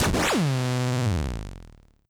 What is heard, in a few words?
laser cannon